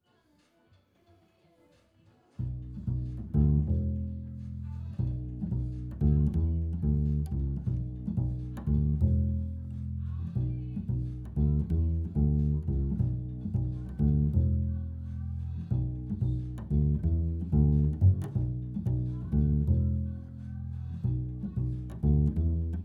Bass Mic

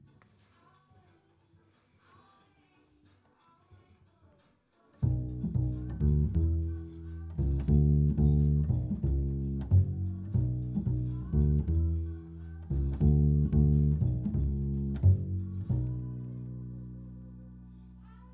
Bass Mic

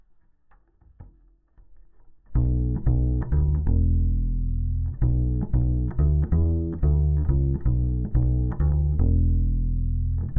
Bass DI

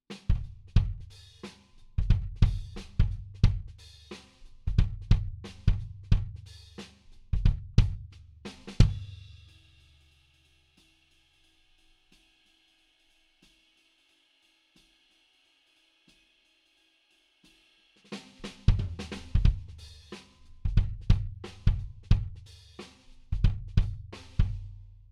Kick

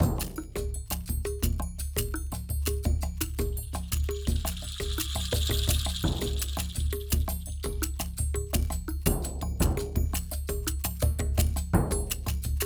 loop, Hammond

